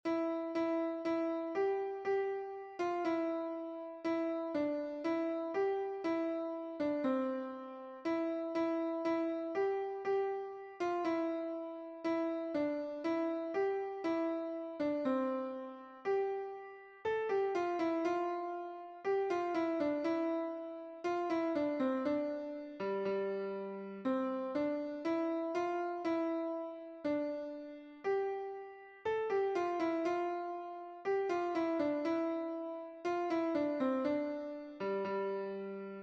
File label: Angels We Have Heard On High Piano